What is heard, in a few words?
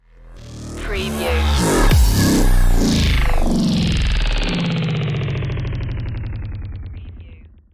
bass tricks stinger